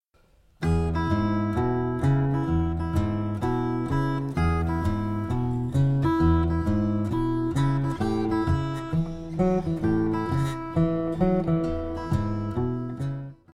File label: guitar boogie